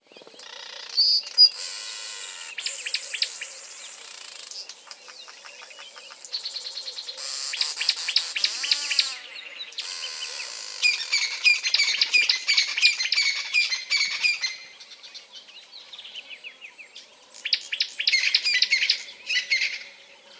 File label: Limpopo riverbed at dawn; Google; Google Sounds; Sound Matters